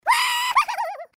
voice laugh; Fruity Burst 2

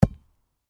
keeper thud; Football! LuckyTap; Eyecon

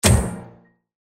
paper; Hot Off The Press; land

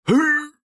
vox short Guy throw; Highland Reels

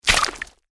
explosion; Fruity Burst 2

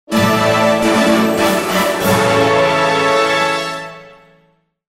fanfare; Enchanted Prince 2; Eyecon

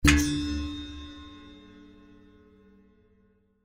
selector Click; Dynamite Digger Eureka

